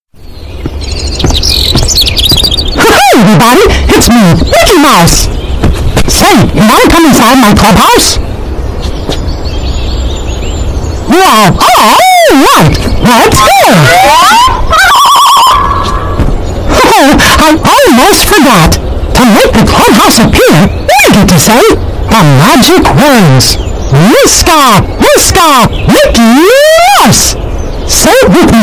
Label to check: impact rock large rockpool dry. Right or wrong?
Wrong